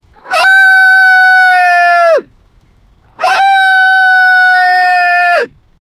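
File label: scared horse neighing; animal vocalizations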